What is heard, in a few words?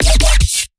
bass hit double up F; Virtual Riot